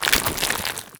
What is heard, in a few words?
zombie move, foley; creatures; Half Life Model Pack